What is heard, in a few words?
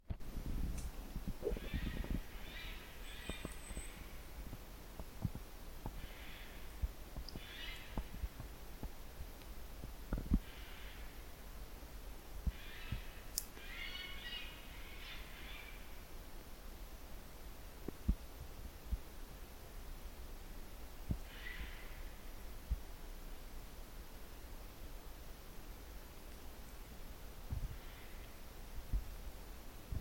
Garrulus glandarius; SETE